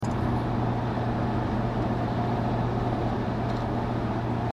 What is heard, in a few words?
05gto sport interior 70 mph cruise 6th gear, exhaust systems; corsa